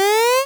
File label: jump